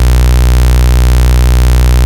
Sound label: Root B, Ultimate Bass; Vengeance Samples